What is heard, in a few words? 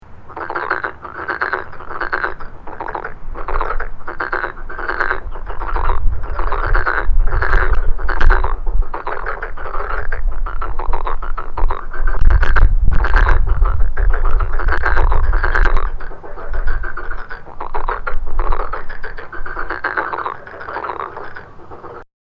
Rana nigromaculata